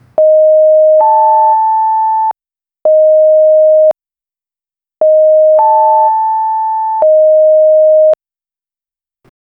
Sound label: modern smartphone rington